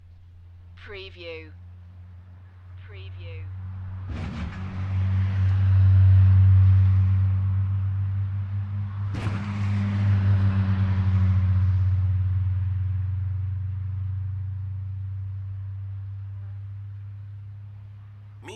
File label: Vehicle driving over two cattle grids; beanstalk audio